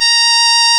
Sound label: REED A#; Big Fish Audio; Synclav FM